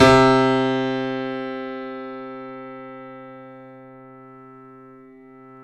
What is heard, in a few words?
KEY, YC7 Piano ff